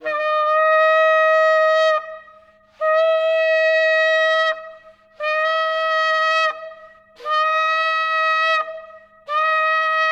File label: Trumpet; افکت صوتی